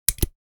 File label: Heavy hole puncher mechanical press